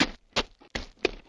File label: Walk